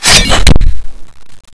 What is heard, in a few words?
crysis deploy, knifes